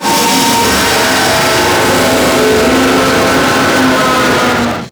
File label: Sentient Vessel Movement Limb, Enemies; Warframe; Tau